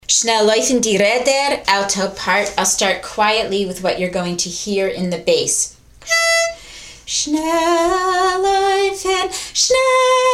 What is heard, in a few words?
alto; Shnel Loyfn; shnel loyfn di reder